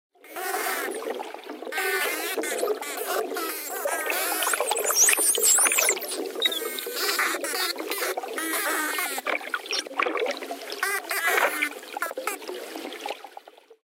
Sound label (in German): Atlantischer Gefleckter Delfin; delfinstimmen und walgesaenge; Walstimmen